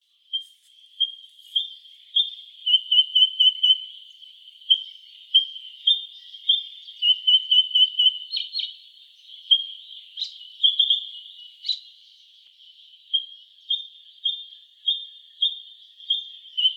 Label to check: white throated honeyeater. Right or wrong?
Right